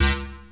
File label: stab; Dirt-Samples